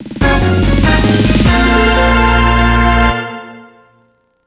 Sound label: Jingle ~ Good Play!; mario super sluggers; wii